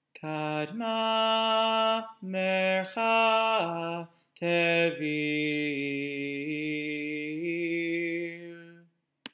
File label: Torah Trop Pattern